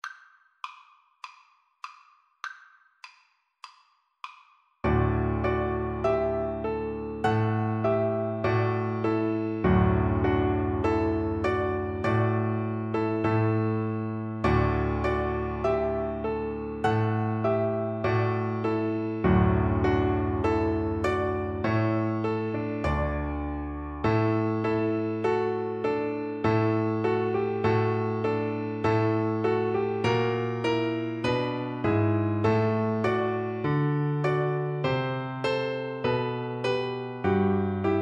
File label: beethoven ode to joy, Double Bass